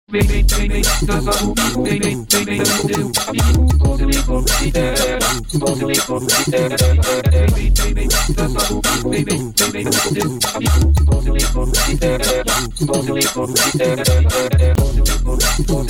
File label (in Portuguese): jaws cantando; O jaws também canta!; Português